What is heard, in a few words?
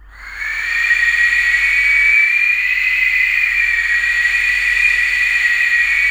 TURBINES, VOCAL WIND; ILIO; Vocal Planet; Jazz & FX